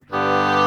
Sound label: alto flute oboe morph